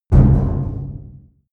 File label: medium metal impact